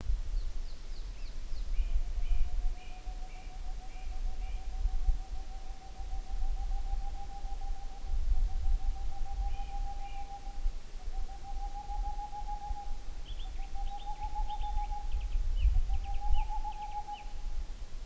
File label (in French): Chouette de Tengmalm et Grive musicienne; Aspe